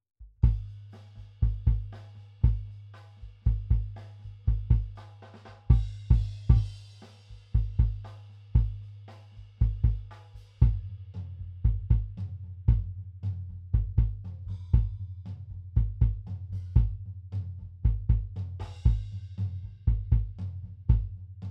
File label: Kick